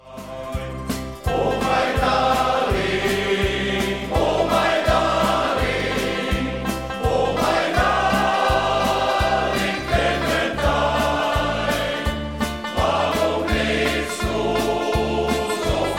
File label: American Folksongs